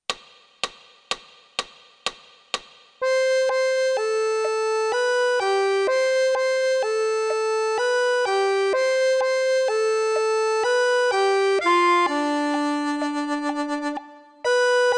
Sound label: Cielido lindo, Folk Mexico